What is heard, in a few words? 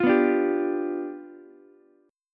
G 7th G down Ukulele; G7 strummed; JGuitar